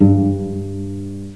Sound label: G string pizz, cello